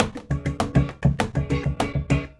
junkyard rhythms, drums; Sonic Foundry (Sony Creative Software); Vital Drums

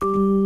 60's Organ

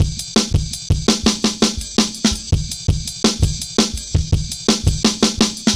Jazz Ride A, Drum Loops, 166.5; Jungle; Zero-G Jungle Warfare 1, 2 & 3; 90 s Sample CDs

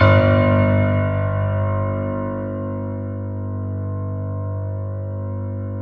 PED FF G, GRAND PED; Best Service Advanced Media Trax Modern Composer

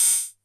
HH Open, drum kit; Polyend; Stazma & Concrete Collage; Concrete-55

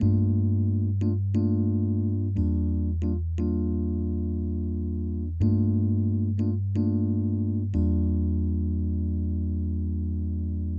Hip Hop and R&B Drumloops

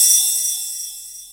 Internal Drums, Roland JD800

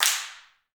handclaps, Heart of Africa; Spectrasonics; Heart of Africa 1

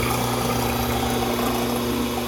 grease disposal gurgle

